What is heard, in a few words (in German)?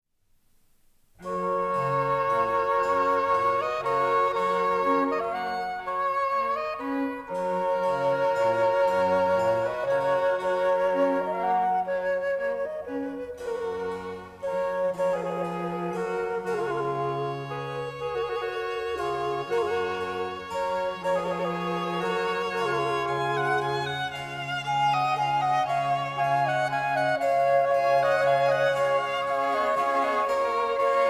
Quartett G Dur Largo